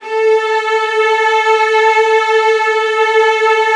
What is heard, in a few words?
A2, Strings; ILIO; Kirk Hunter Virtuoso Strings VOL-3; STR TAME